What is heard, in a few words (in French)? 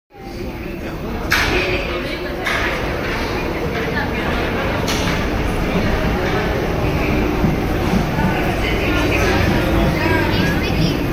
Station République